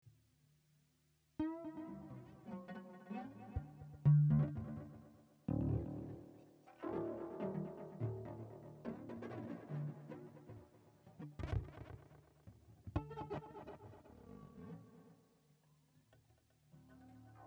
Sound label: bass